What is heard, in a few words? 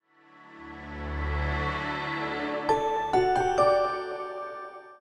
boot sound; Huawei P20